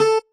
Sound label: marimba; ゆめ2っき